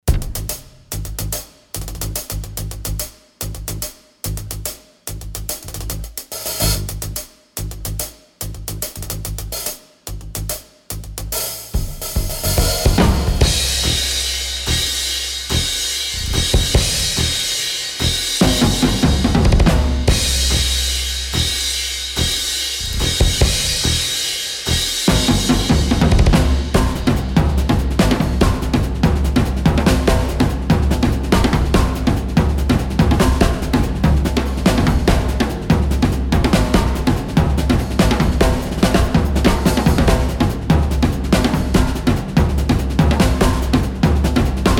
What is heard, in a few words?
DRUM & BAS; BAG OF TROUBLE